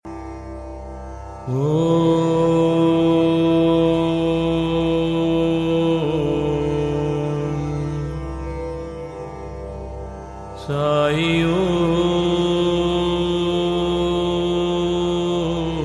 Om Sai Om

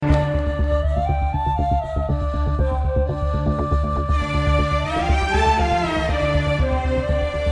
Oriental Music

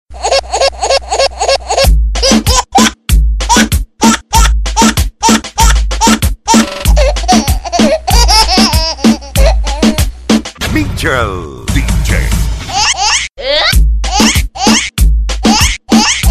Baby Laugh Dj Mix

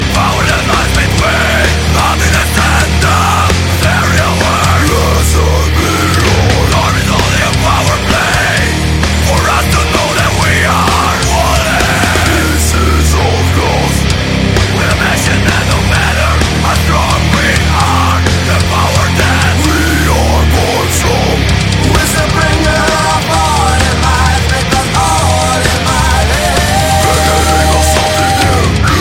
Hungarian Metalcore

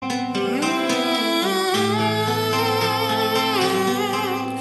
Mhhhhhmmmm; meloboom

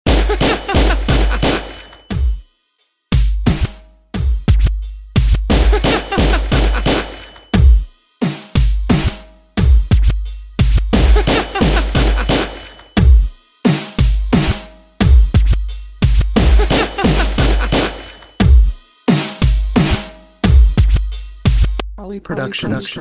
Bass Gun Beat

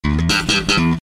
Punk Bass

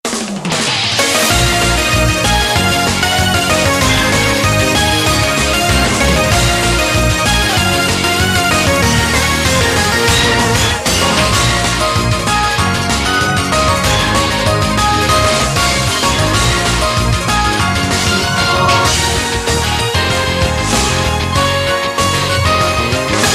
Duet

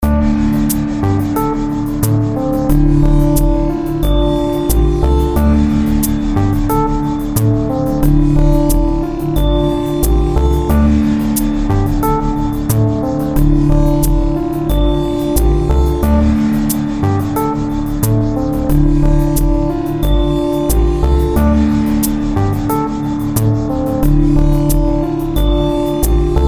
Sitar Nightmare

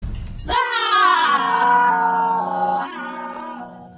yell

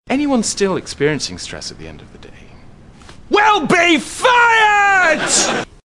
Stress; It Crowd

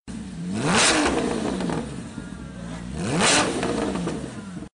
Porsche 997 GT3

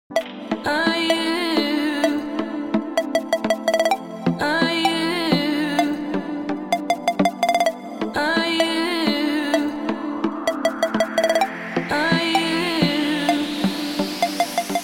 Dance Beeps

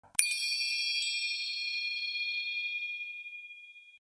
Chime

